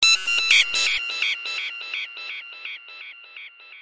sonic sweep

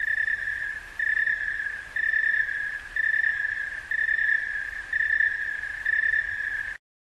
grasshoppers

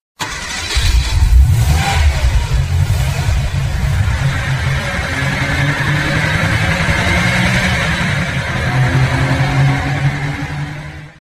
demon car

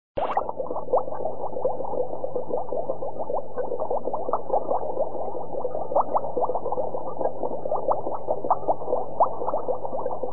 couldron bubble

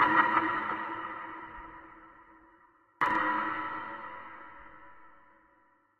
tunnel drip

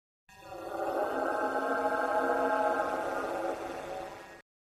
Demon

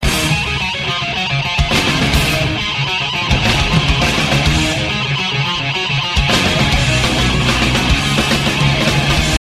Guitar Rift

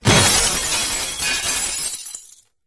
glass break

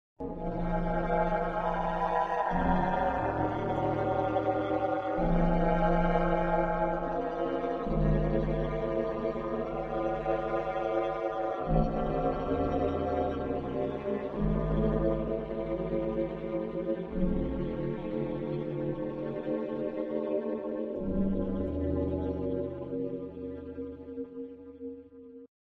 Demon

